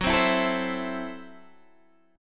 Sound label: F sharp Minor 7th Flat 5th F sharp Guitar DADGAD